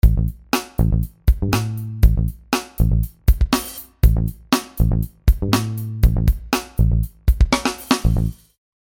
Loop Comp

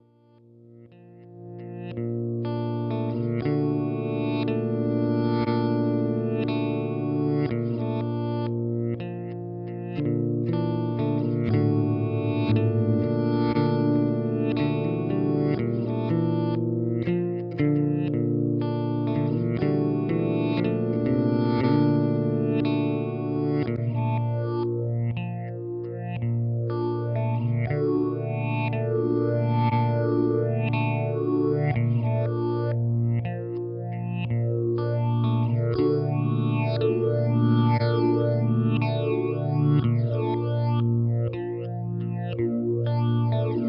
Strymon Zelzah 6 Stage Voice

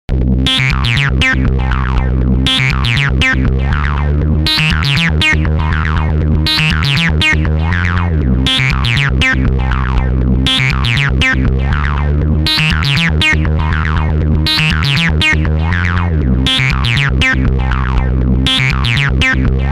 Acid Nature

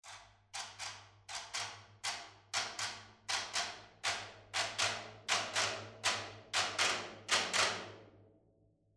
Cerberus Clack Ensemble

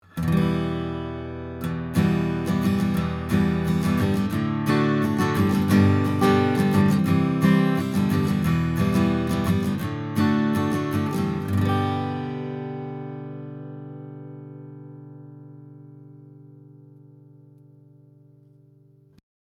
Guitar Omni; OC-818; Polardesigner

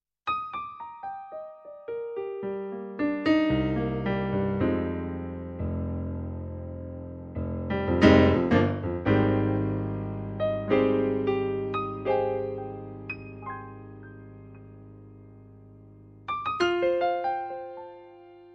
Piano

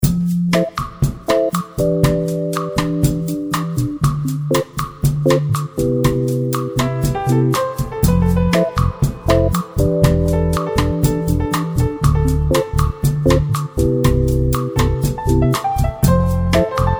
Bossa

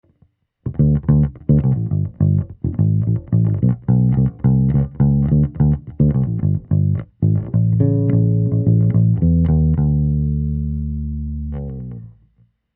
avantone ck7 Bass amp

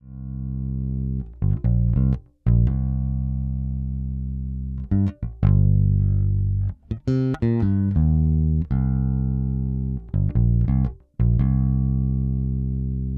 Warwick Gnome i Pro V2 Jazzbass Neck Pu

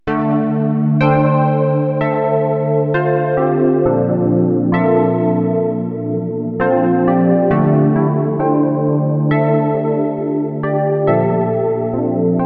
China Piano

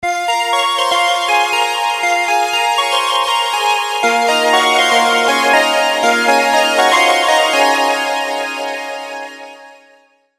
SX240 INTERNAL CHORUS FX

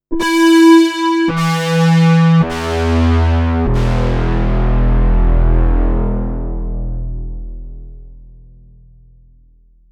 Detune Unisono

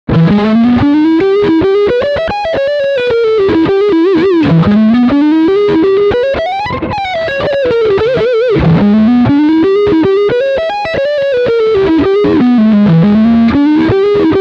Lick Front Humbucker

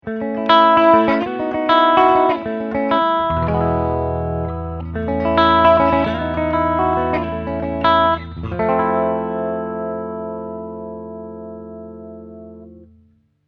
Clean Picking

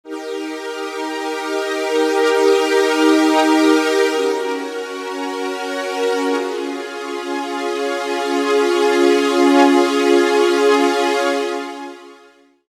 8 osc phasing

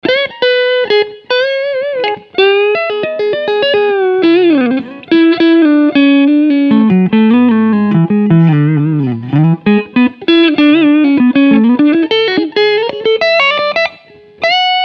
Clean Picking Front HB

